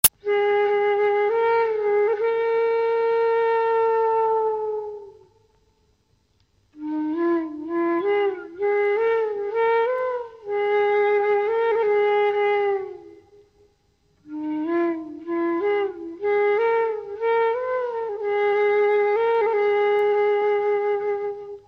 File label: Slow Flute; Malgudi